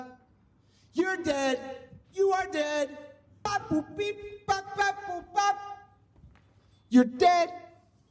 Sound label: jump